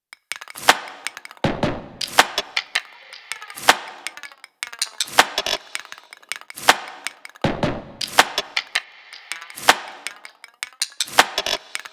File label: top loop 80; Pop; Drums; Loops; Slate; SD PASADENA Pop Sample Pack